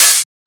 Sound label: Open Hat; FLPRO